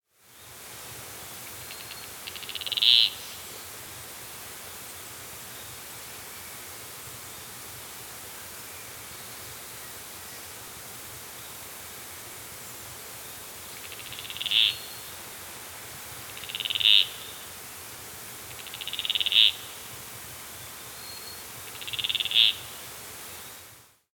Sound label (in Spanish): Cotinga Cresticastano Ampelion rufaxilla